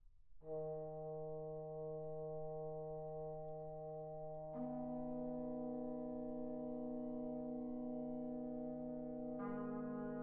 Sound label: Brass Quintet